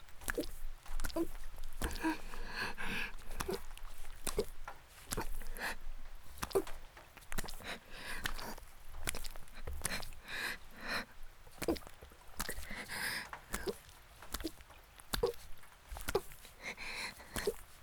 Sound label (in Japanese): ギシアンループ (雨降りバージョン); 田舎の別の無感情少女と自己犠牲愛; 第4、5話のギシアンループバージョン